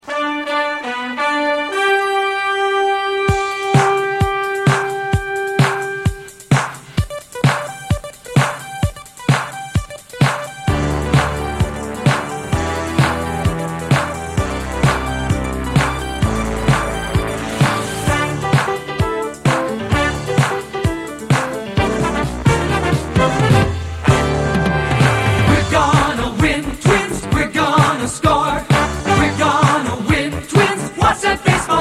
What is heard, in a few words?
Jingle; WCCO; Win Twins